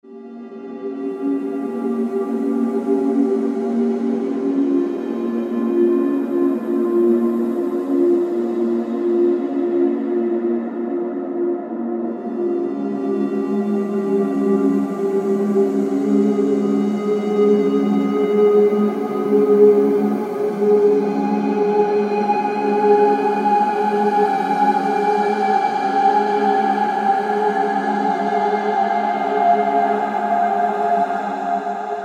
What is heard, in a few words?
Winds, synth; Trying Winds